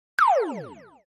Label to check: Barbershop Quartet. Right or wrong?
Wrong